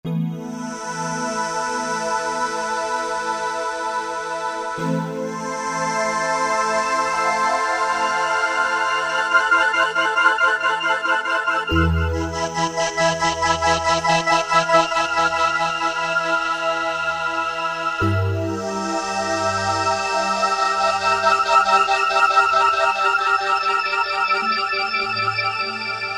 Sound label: Snowfall, E-mu Morpheus; Factory Presets